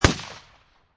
Shot Gun Remington 870 12 Gauge Bird Shot Single Shot Exterior At 45'